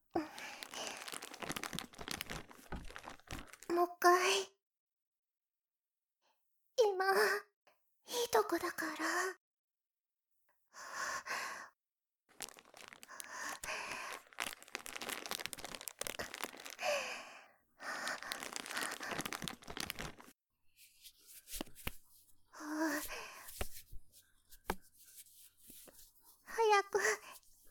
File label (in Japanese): 立位 激しく